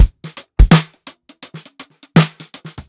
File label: SWUNG 83 BEAT, DRUMS LOOPS; BASEMENT DRUMS VOL 2